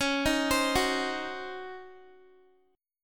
C sharp Major 7th Suspended 2nd Suspended 4th, Guitar; C#M7sus2sus4; arpeggiated